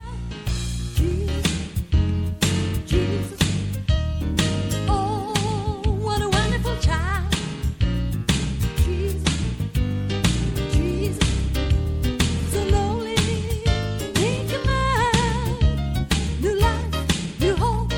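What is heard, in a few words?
Jesus what a wonderful child, Soprano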